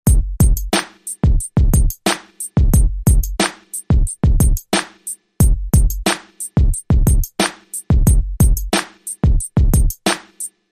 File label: Drum Loop